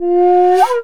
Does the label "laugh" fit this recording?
No